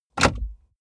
car bmw e46 interior drink holder insert bottle hard